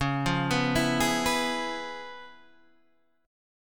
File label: C sharp 7th Sharp 9th, chord; C#7#9 Chord {9 8 9 9 9 7} arpeggiated